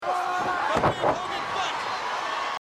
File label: boot; big hogan